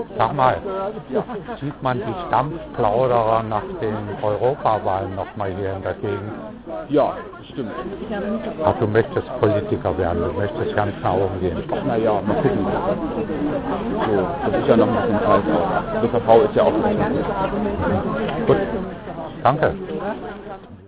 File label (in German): dampf